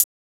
hat hhhattt; neuro; Sinistarr; Drums

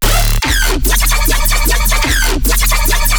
bass loop 150 D; Virtual Riot

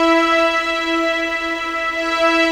Violins; Sonic Foundry (Sony Creative Software); Orchestral Series VOL-4; Rock and Pop; Rock-Pop 04

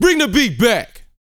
VOX FF, Vocal Planet R&B & Dance HIP-HOP; ILIO

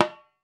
REPIQUE; World Combo

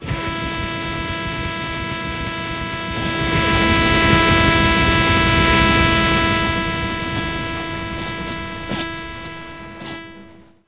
FOODTECH, MACHINES; USB Soundscan vol.33; AKAI; Disjointed Textures

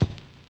RAGGA; Spectrasonics; Metamorphosis; RAGGAVYNL

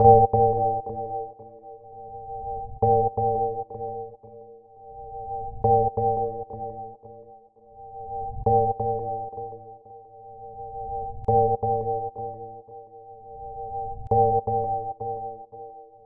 Melody, Hip-Hop

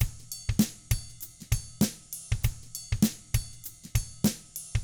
FUNKY Drums

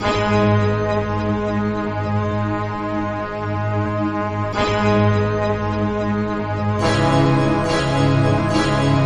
Dramatic Horns; Club Joints and Street Anthems; Lions Den

